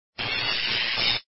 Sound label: thunder